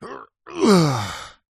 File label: male voice groan relief after lifting heavy weight; zapsplat